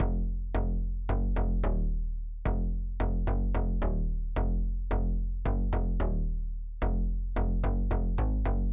Synth Bass, pop rap

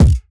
Kick Sample, hyperpop beat

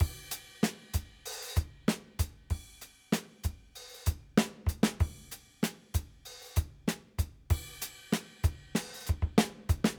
Live Drums, modern hip hop

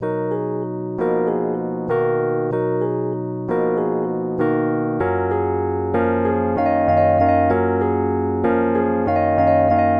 Keys, modern hip hop

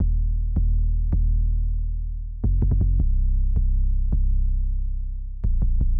808 Pattern, hyperpop beat

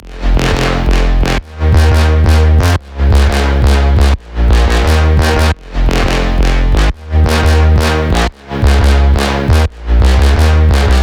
Sub Bass Brass Synth Bass Trombone, drum and bass

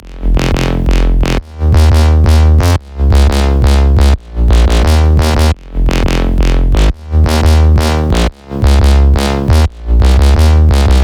Sub Bass And Brass Synth, drum and bass

